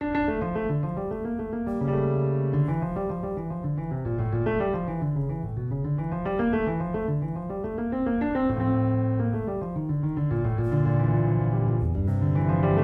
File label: Suite pour Violoncelle No2 in minor BWV 1008 Courante; Nomenclature OST; Bach